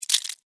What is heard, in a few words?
Insect Claw Wet, Insect, Claw, Wet, Juicy, Snap, Movement, Bug; Sound Effects:Animals:Insects; Insect Claws; Blastwave